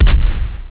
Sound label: firework